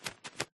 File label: fly, parrot; Minecraft